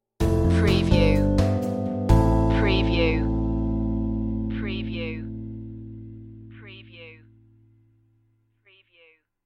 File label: jazzy idents